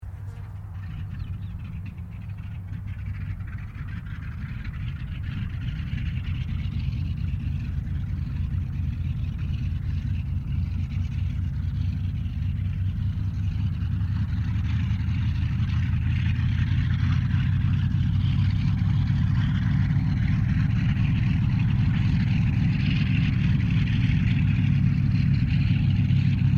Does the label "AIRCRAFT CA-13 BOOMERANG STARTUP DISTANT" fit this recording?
Yes